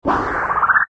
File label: robotz open; windows 9x; sound schemes